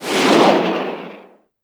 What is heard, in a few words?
tarantula rocket